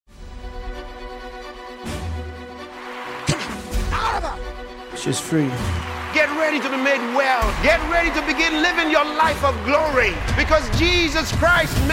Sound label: JINGLE; HEALING STREAMS